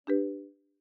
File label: end sound